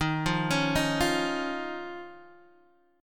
Eb 7th Suspended 2nd Eb, Guitar DADGAD; x,6,3,6,6,3; arpeggiated

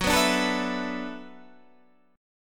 D 6th Add 9th Gb

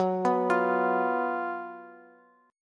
Gb Major 7th Suspended 4th, down, Bass; arpeggiated; JGuitar

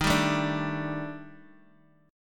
D sharp Major 7th Flat 5th, down, Bass Standard; strummed; JGuitar

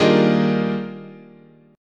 Eb Major 11th, chord

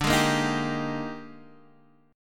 A sharp 13th

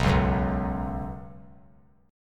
B Suspended 2nd, chord; Bsus2 strummed; 11,8,9,9; 3C,3F,3A,3D; JGuitar

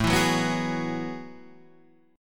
F sharp Minor 11th

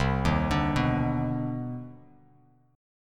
C Minor Major 9th, Bass Standard, CmM9 chord; arpeggiated; JGuitar

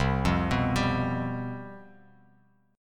C Major 7th Suspended 4th, down, Bass Standard; arpeggiated

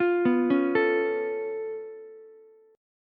C sharp Augmented 7th, C sharp, down, Ukulele; arpeggiated; C#+7; JGuitar

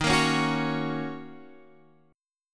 F sharp Major D sharp; F#/D# strummed; JGuitar

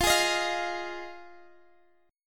F Minor 7th F Guitar Drop D

